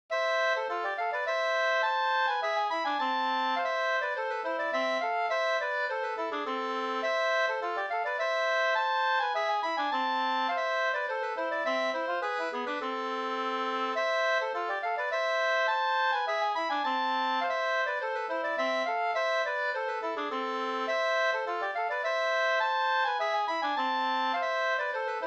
polska, Amoll, 2st

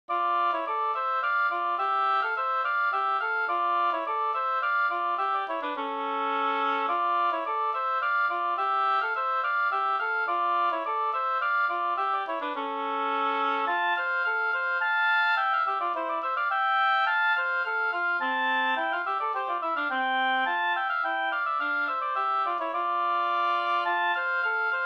slängpolska

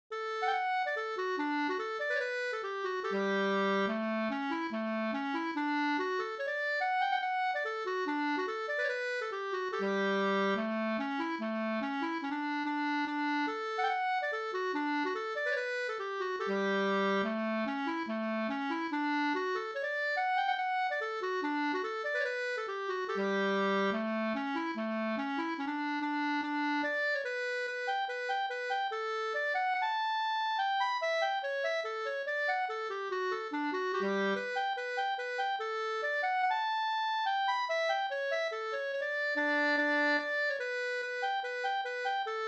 reinlender

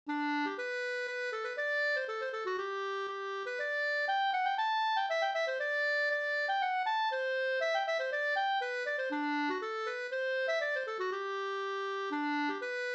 polska Bodapolska

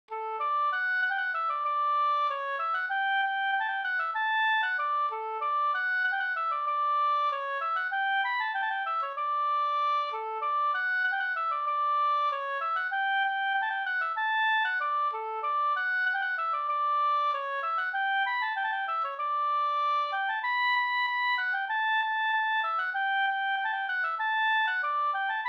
ganglat, marschen; Varmlandsnas

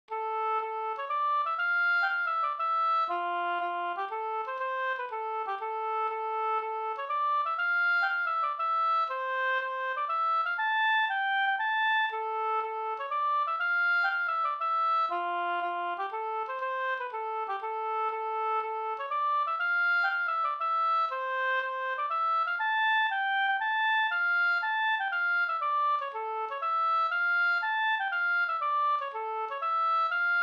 polska Bodapolska

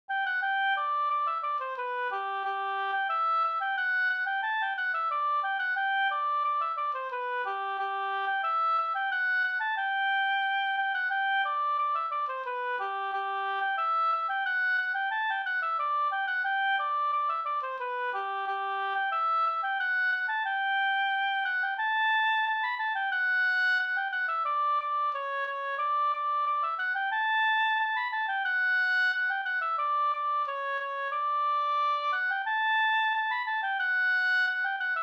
folkdans, kadrilj; Skraddarkadrilj Go

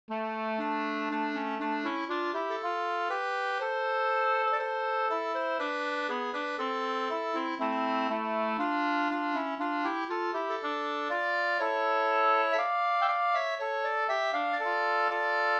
brudmarsch; Enviken

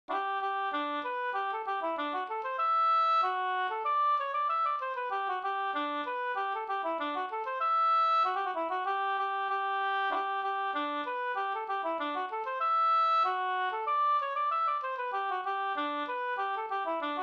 folkdans; Lybeckare Bh

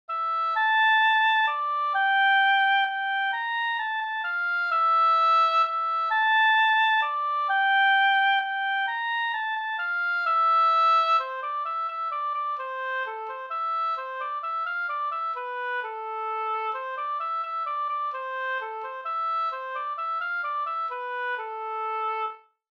polska fr Osterdalarna

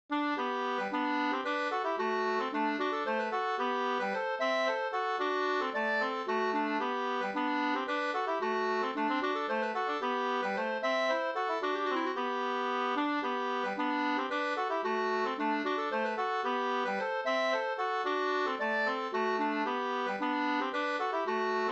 polska, 2st; e Gustav Svedmark Tierp Up

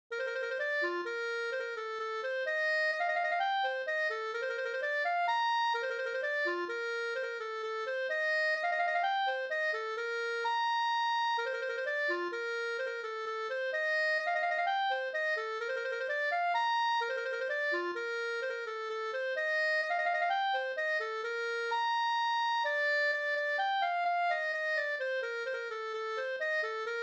polska; fr Gs Allmogel Gavle Nr2

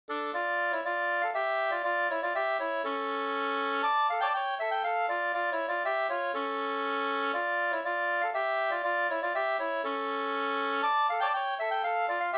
polska

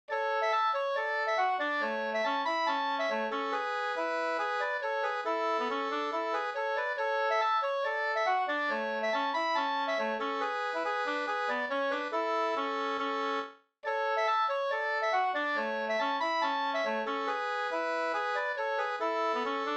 schottis; e Oskar Mokvist Jm 2st

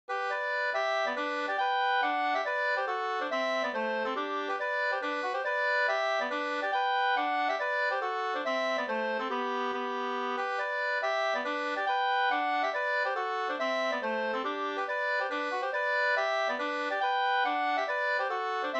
hambopolska; Driver dagg faller regn Hs 2st

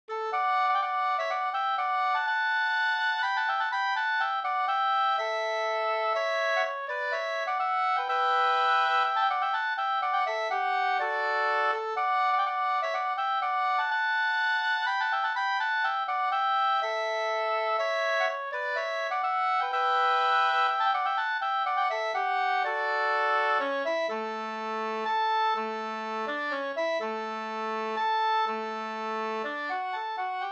hamburska

